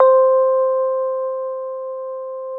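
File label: AMP FENDER C5, Keyboards Old School; USB Soundscan vol.09; AKAI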